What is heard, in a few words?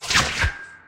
zip; Fablewood